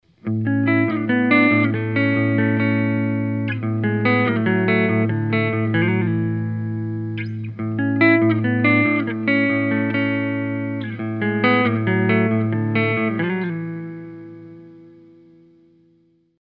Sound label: classic clean, humbuckers; phillips classic; pickups; phillipspickups